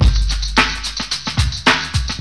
BEAT, Beats 106-111bpm, Hip Hop

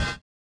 collision; Spectrasonics; Metamorphosis

